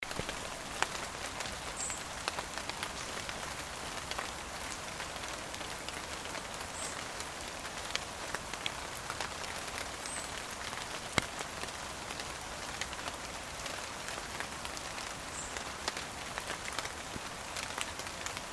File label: Rain on leaves while walking the dog, nature soundscape rain weather; dreamymarmot